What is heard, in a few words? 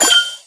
Big Coin